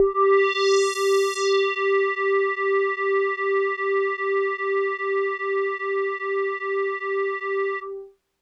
Hologram Kawaii G3; keys

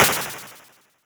Noise

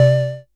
SYNTH Little blip

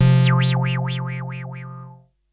SYNTH Squared

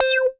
Acid C4

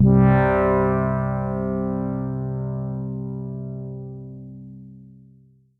synth brassey corn pad

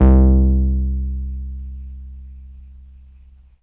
TB303 Square Vinyl Synths C1

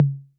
Tom Mid 808 Color

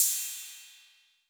Cym B 808 Decay A

